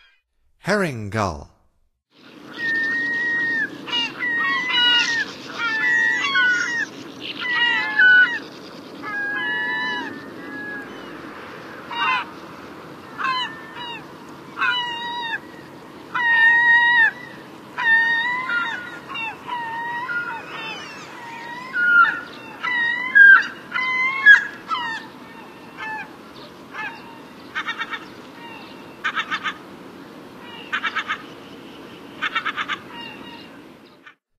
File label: Herring Gull, Songs of Birds of North America; Stokes